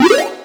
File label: sound jump; godot; platformer